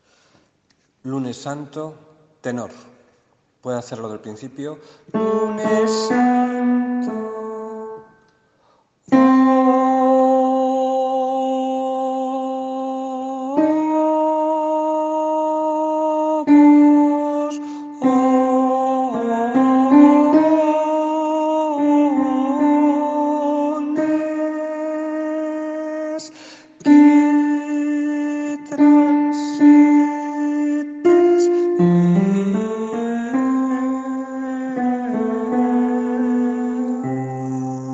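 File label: tenor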